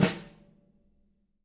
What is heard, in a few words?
SN, snares; Sampleheads; Peter Erskine Living Drums